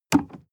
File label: sports car int pedal gas step hard; verkehr transport; autos